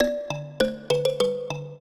trio, mbira; Mozart

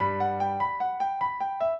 piano; Mozart; minuet

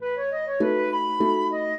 minuet, flute harp; Mozart

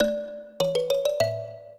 minuet, mbira; Mozart

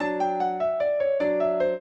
trio, piano; Mozart

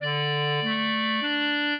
clarinet; Mozart

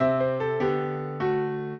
piano; Mozart

